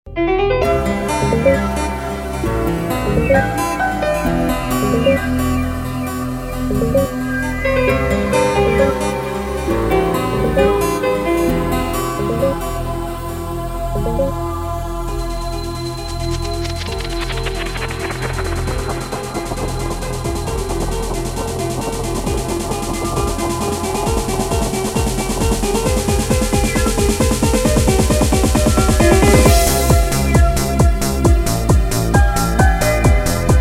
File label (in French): techno synthe